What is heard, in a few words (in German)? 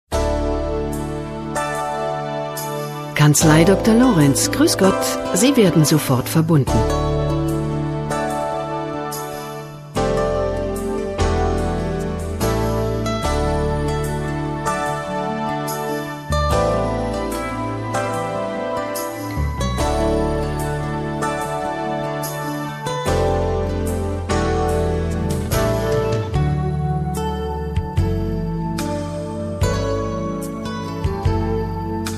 Warteschleife; DrLorenzLorenz